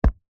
foley footstep single trainer wood floor gentle soft